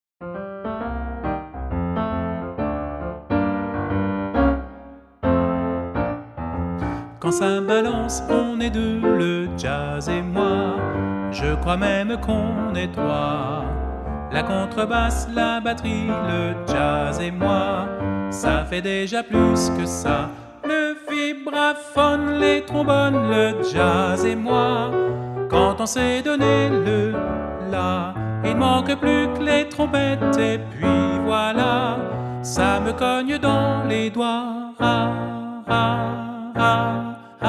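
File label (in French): Quand çà balance VOIX, chant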